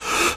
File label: Hold Breath